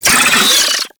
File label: Wall Climb Movement Vocal; Warframe; Oraxia